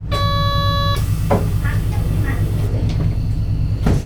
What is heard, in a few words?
door